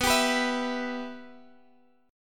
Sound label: B Suspended 4th Sharp 5th, Guitar, Half Step Down